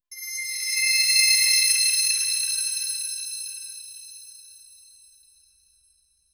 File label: soft sparkling chime; kids